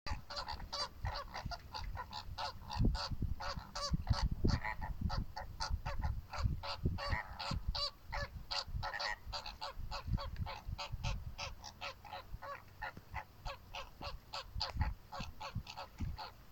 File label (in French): flamant rose phoenicopter